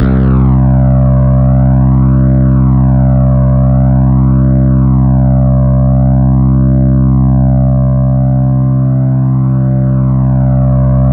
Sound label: C2, SynthLine; 90 s Sample CDs; NiceBeats; SynthLine VOL-33